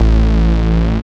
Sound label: DR. LOOP, BASS; X-Static Goldmine 3